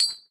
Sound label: Ui Gaincoin